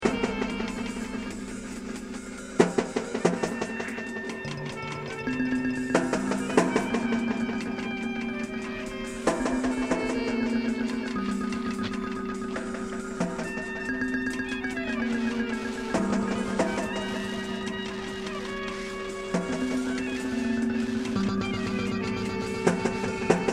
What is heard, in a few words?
Hum and Bass